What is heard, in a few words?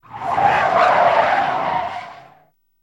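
Cadillac 72 Corner Skid; Cadillac 1972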